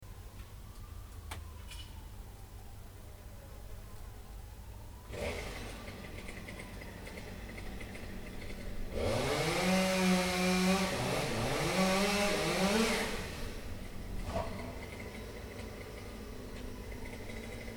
chainsaw

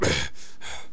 pain; sarge

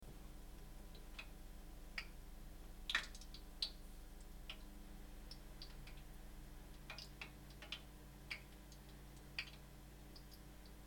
oil boiling

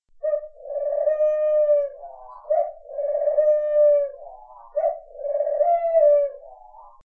dove cooing

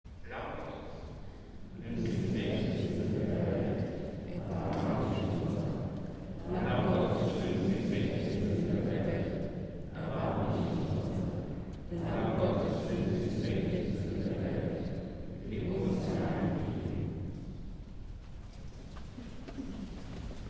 mass ecumenical german agnus dei

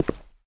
boot, footsteps

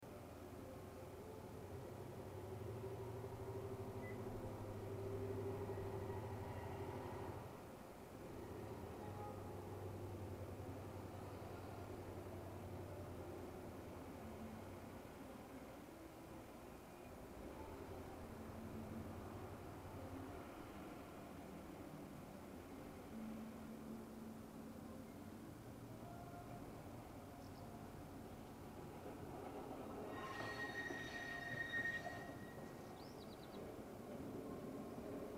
railway station

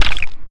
splash, footsteps

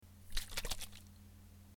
snow globe shaken

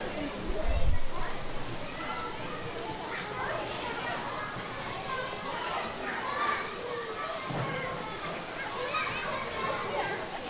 schwimmbad children's pool

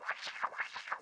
amb, drones

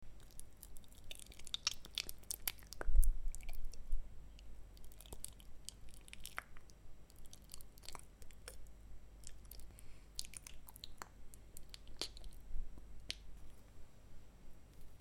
slime sounds

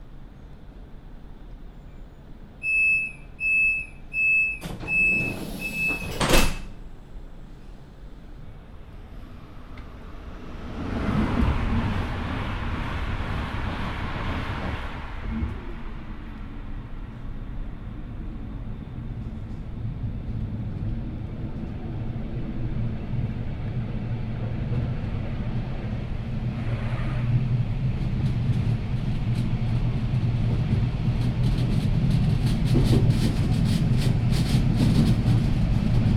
train complete ride between two stations

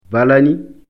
balani; kulle

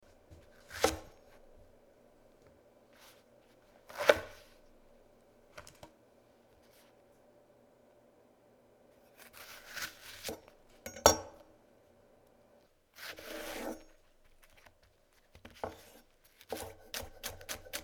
chopping leek

